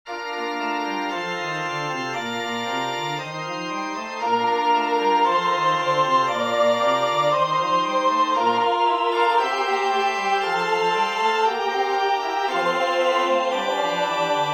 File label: Psaume 18, non avvi popolo, TUTTI; Marcello; marcello benedetto; Caeli Enarrant